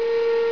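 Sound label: elevator move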